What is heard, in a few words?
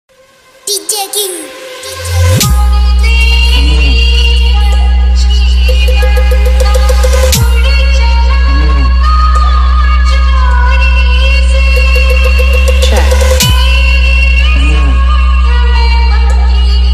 Full Bass Vibration Mix Sound Check